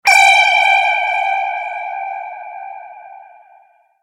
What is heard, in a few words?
energizing event